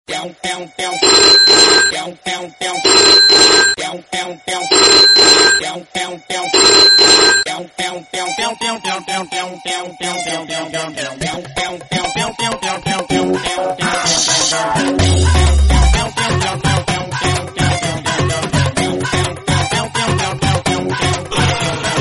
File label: funny bollywood movie music tone ringtone